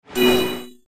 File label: Super Match Made; disneyxd; bryx